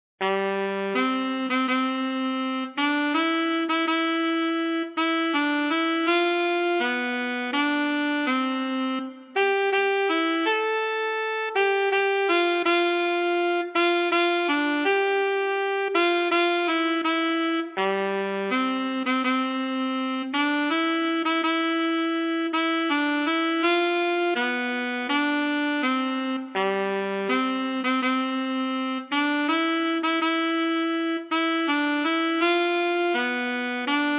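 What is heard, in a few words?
solo alto saxophone; O Tannenbaum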